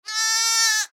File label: Sheep Baa Lamb; Animal; Sheep Sound Effects